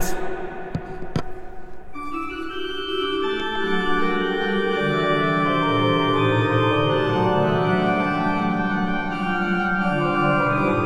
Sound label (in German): Orgel, pfeifenorgeln; Klais